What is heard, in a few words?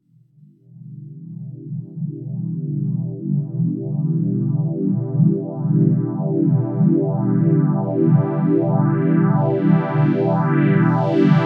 king korg phased pad